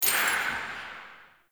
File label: Vendor Buff Menu Hover; Tau; Circles Of Hell